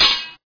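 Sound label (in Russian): удар железным мечом по железу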